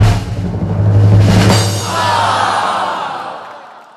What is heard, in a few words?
drum roll lose and disappointment; Rock Papper Scissor